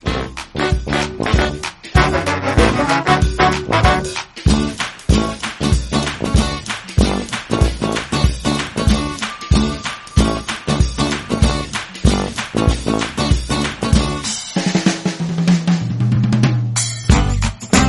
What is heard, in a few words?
theme instrumental; mickey mouse clubhouse